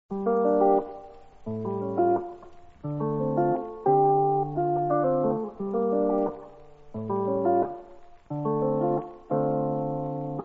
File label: Lo-fi Type Beat Rain